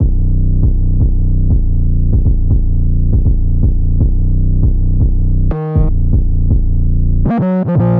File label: 808 loop 120 B min